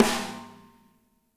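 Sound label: snare left; Spectrasonics; Burning Grooves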